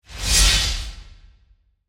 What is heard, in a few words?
Whoosh Heavy, Metallic, Transition; Production Element; Imaging Element; BlastWave